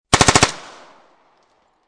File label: MP5 9mm 5 Burst Barrel, Machine Gun; Machine Guns; VRSonic